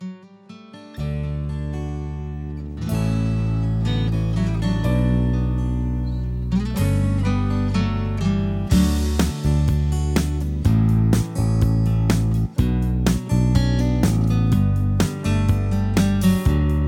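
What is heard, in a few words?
Instrumental without background vocals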